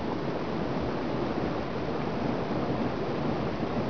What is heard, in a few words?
flare